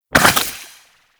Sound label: Goo Gun Tail; Warframe; Grineer